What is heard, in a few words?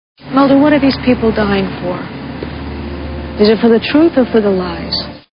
dying